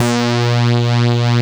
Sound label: SAW WAVE C3; Greytsounds; Scott Peers Sound Engineering